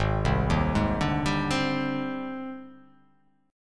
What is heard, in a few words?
G sharp Major Sharp 11th, chord